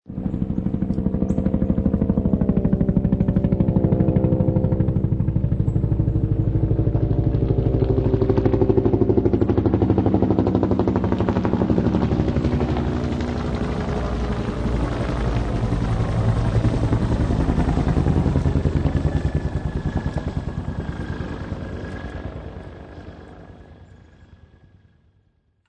High quality stereo recording of a military helicopter flying overhea; flying overhead from Quantico marine base to the White House